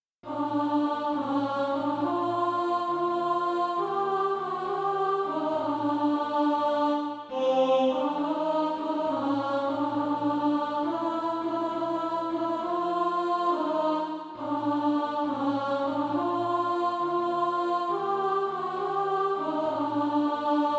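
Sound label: alto, Sheet music (SATB) including Practise Music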